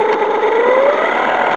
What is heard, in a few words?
CAMERA WIND; Zero-G Ambient 1